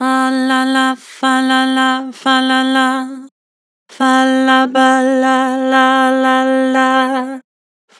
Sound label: Vibrato Multi, Vocals; Harmor Vocals